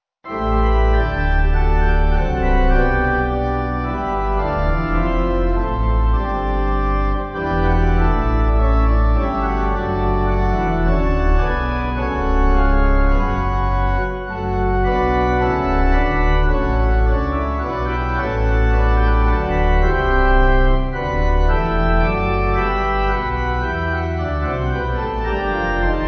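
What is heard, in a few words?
Pipe Organ; Come Sing Of The Springtime; Turner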